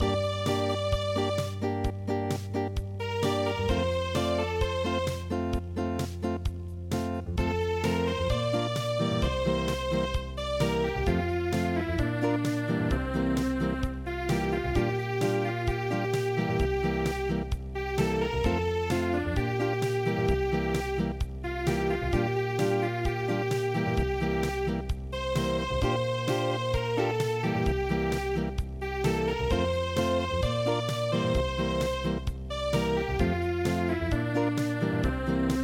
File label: Acoustic; midi; traditional